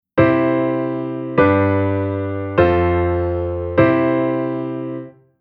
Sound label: Chord